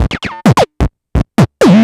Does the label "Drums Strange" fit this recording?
Yes